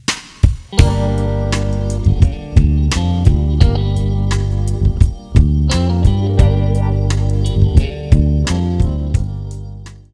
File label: music